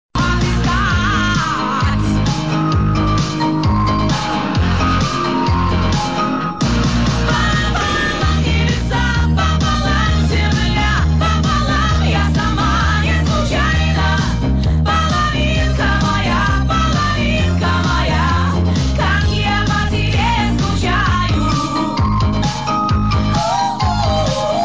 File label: 85 110 MHz